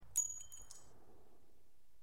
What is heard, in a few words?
Empty Bullet Drop